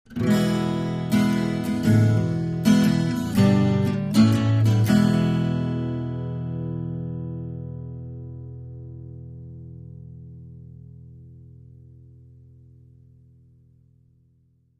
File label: Acoustic Guitar Blues